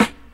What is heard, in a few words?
Snare; ForestHill